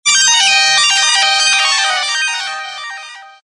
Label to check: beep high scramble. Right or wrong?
Right